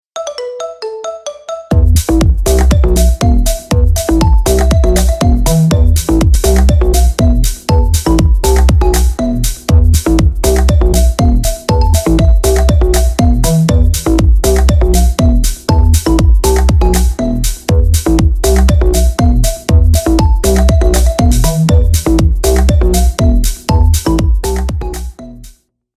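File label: background music korean ringtone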